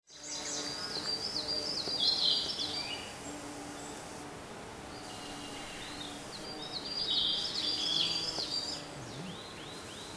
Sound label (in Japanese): saezuri